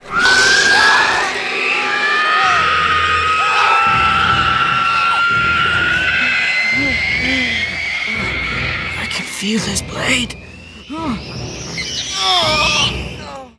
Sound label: Screech Long; Nazgul